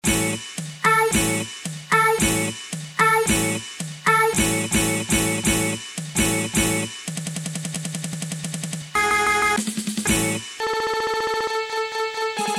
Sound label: instrumental